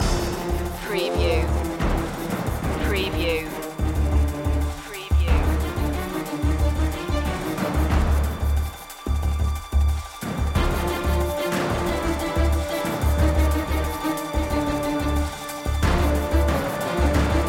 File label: agent run loop